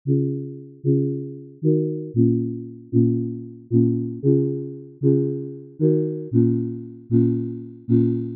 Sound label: Synth; Medasin; FOCUS Preset Pack